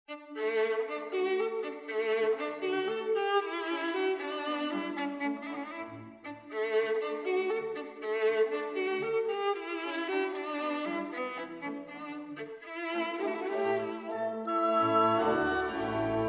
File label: Violin Concerto in D Major, Rondo - Allegro; Op. 61